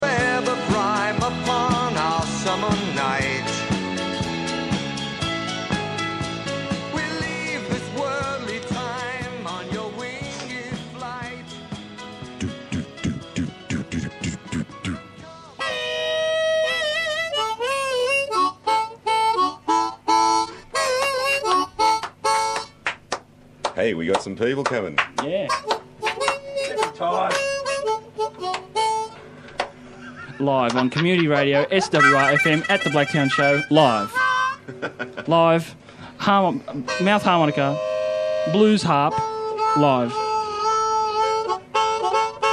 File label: Blues Harp; Blacktown Show